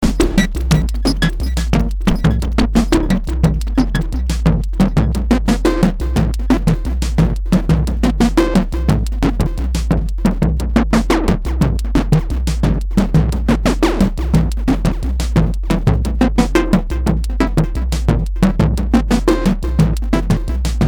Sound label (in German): Sequencerhuellkurven, spectralis